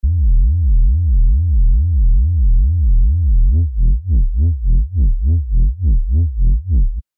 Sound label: Bassline Rumble; alonso 19 year anniversary pack